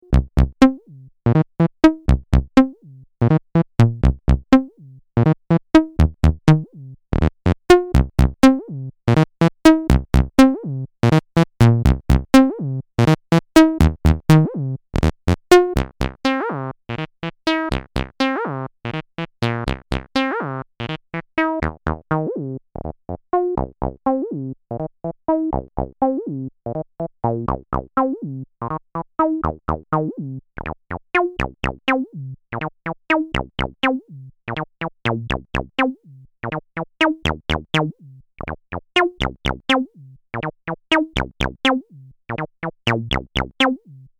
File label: x0xb0x Saw